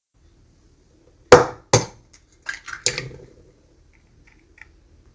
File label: Breaking egg